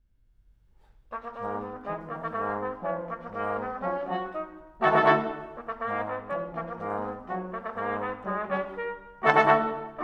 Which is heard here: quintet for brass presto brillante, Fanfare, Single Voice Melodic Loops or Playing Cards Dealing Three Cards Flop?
quintet for brass presto brillante